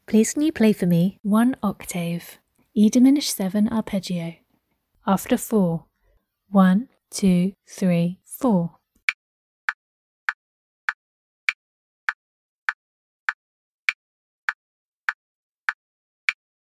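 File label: E diminished 7th arpeggio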